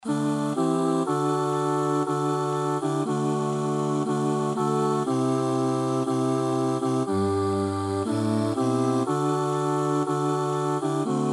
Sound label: chorus